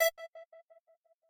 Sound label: synth